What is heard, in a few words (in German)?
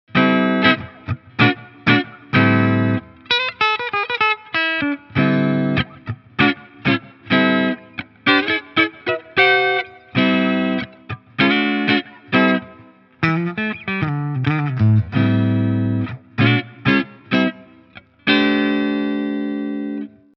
clean Hals; Charvel Pro-Mod DK24 HH FR